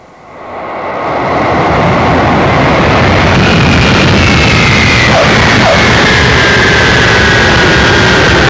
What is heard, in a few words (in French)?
Avion; Blender